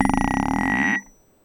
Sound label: Small Anvil, contact mic, Metalics